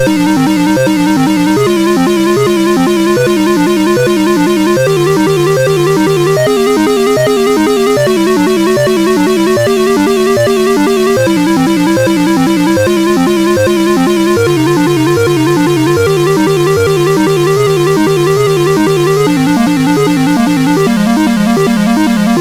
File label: Prelude MOS6581R4